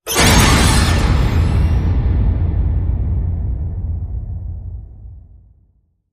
Frightening Boom

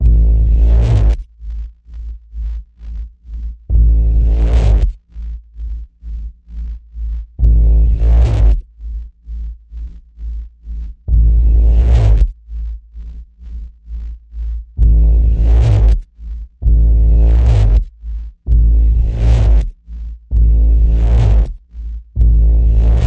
Ominous Pulse A, Key of A

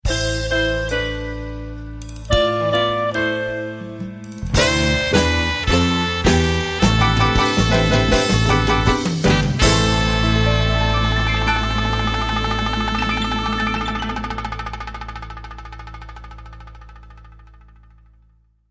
Latin Dream

